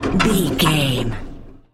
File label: metal rattle spin med